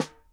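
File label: snare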